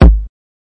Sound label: Kick Drum; Khezie Hip Hop Kit